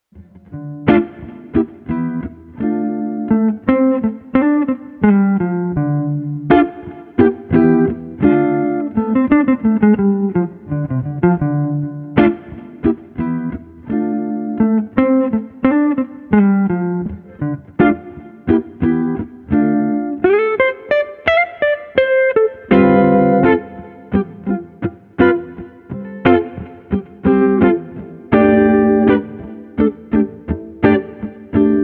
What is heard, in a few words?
Guitar Chill Funk 85